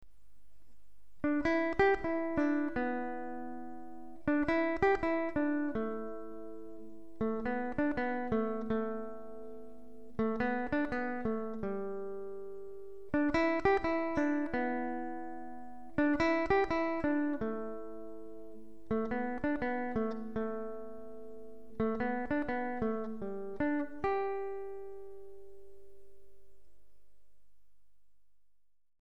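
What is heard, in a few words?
brazil ragtime, bossa rhythms; P.Minvielle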